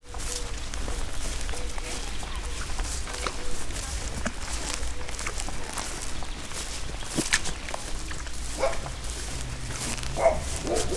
ember elől vízbe ugráló békák kutya, kecskebéka; termeszetben

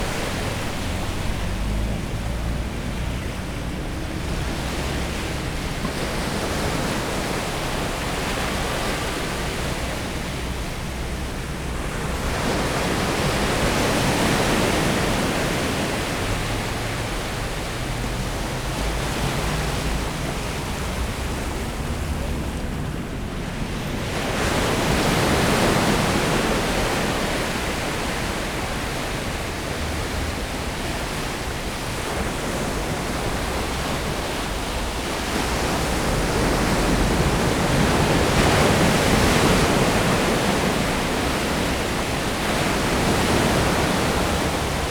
ocean es buszvegallomas

